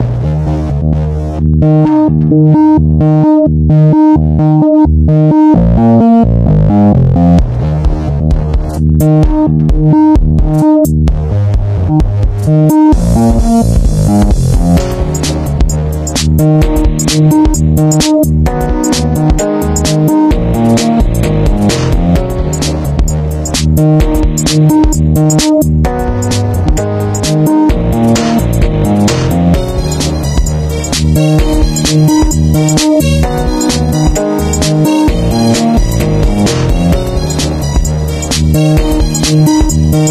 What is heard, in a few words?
city chill; opensurge